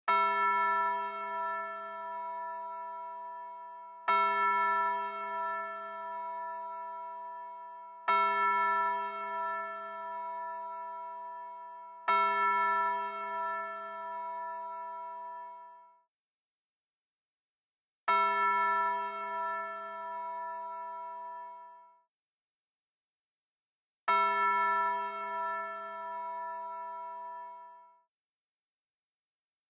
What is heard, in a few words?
Tubular Bell; christmas